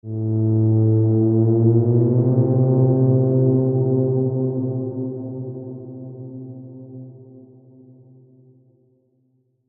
Low Horn Bend

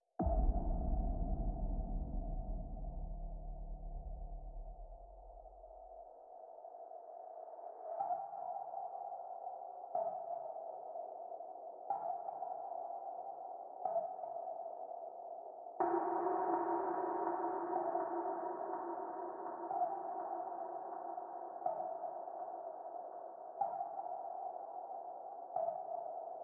Drums and SFX Hits Only

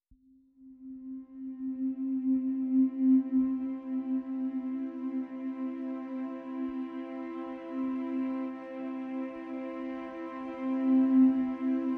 Swan Lake, Strings

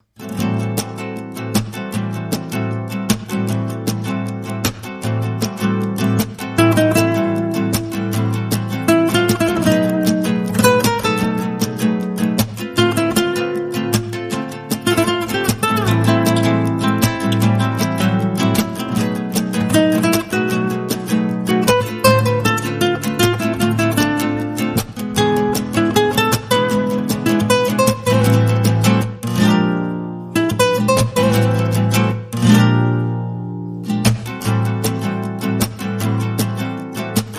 Guitars Only

